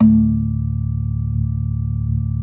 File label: B3 D 2; Best Service Hyper Dance